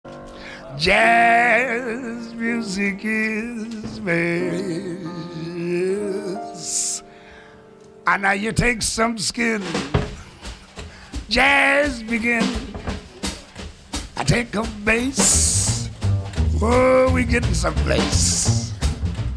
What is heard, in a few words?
Jazz